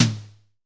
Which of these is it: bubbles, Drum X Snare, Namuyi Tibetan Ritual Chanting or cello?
Drum X Snare